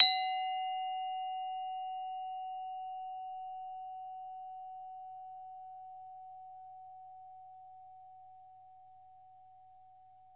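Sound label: SARAN F#4, Metalophones, Pitched Percussion; E-MU Producer Series Vol. 7 – Old World Instruments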